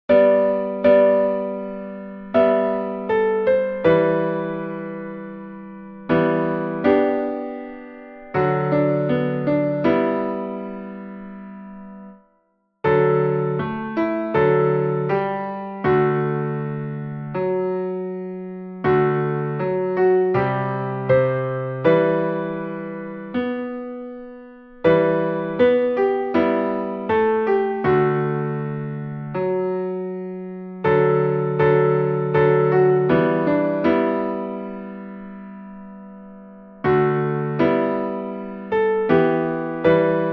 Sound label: piano; 春望